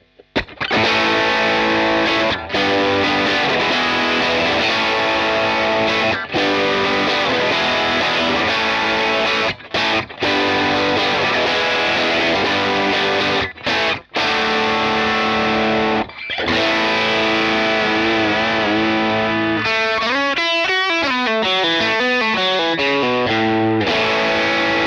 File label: Drive Bridge PU